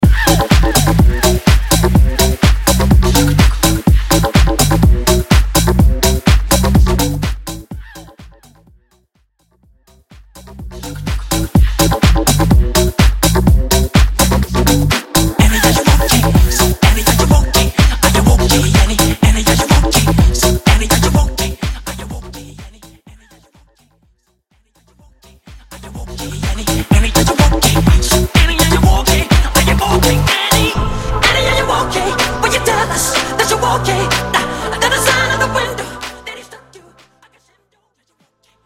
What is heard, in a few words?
80s House Vocal Mix; Mainstream VIP; Vegas Edit